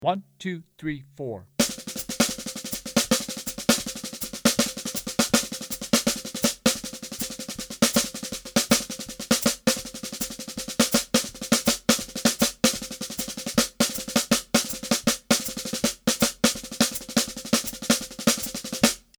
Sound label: Swing 8ths 12 Bar; Adaptive Overlay